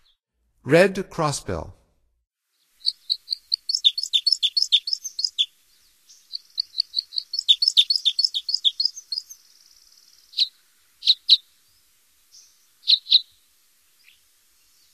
Red Crossbill, Songs of Birds of North America; Stokes

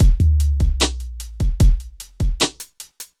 hiphop

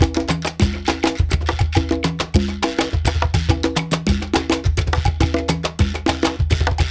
Perc, Percussion Latin

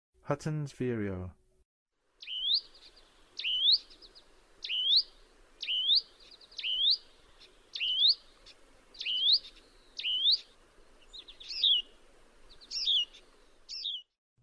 Hudsons Vireo; North America; Western USA; Western USA Songbirds

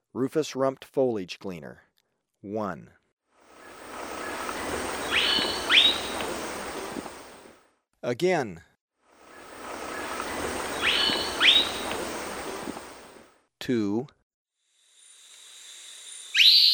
Rufous-Rumped Foliage-Gleaner, Birds of Ecuador; S. America

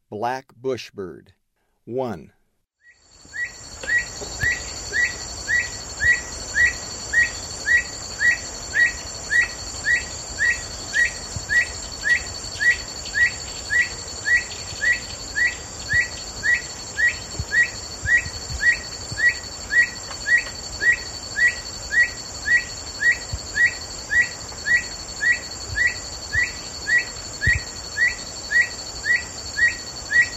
Black Bushbird; S. America; Eastern Lowlands

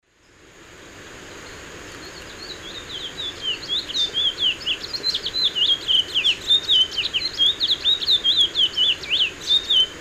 Oryzoborus angolensis, song; S. America; Eastern Lowlands

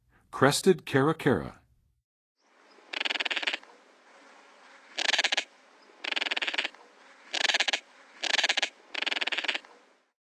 Crested Caracara, Birds of North America; Western USA; Western USA Songbirds

